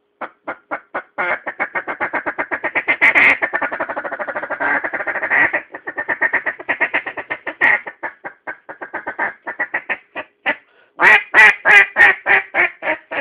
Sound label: duck feed call